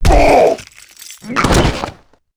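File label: priest death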